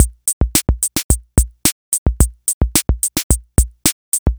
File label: Rock B